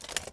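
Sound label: Armour Movement; Civilization4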